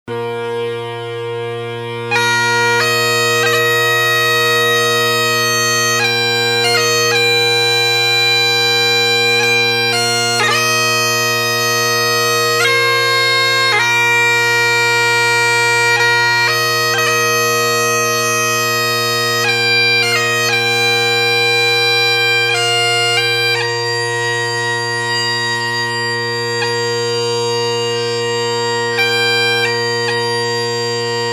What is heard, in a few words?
Highland Pipes